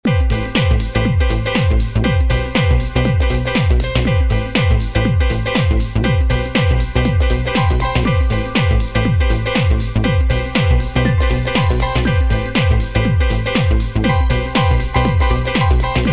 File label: Jazzy House Ringtone